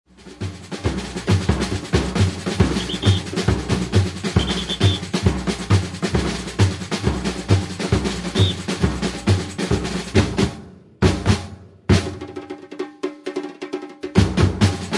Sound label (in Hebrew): Break Ear, מעבר אוזן; Batucada